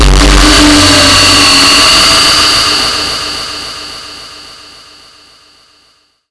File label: portal